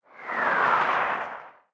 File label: combat atmosphere; World of Tanks